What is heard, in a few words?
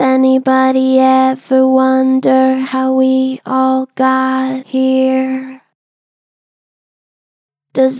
Gentle Flat, Anybody ever wonder, Vocals; Harmor Vocals